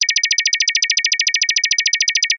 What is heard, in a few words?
science fiction alarm computer fast high pitched beeps